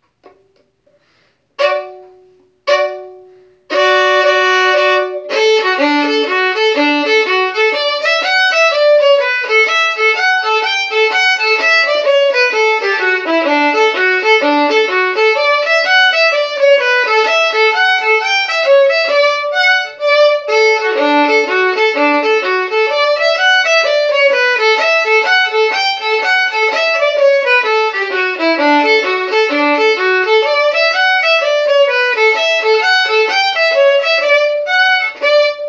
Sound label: Hornpipe